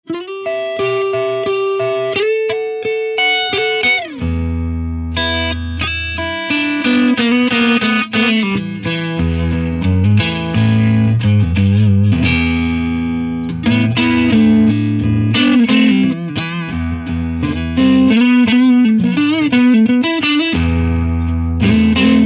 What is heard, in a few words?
G5 EL34 crunch